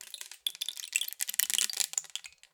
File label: Rain Stick, Percussion Samples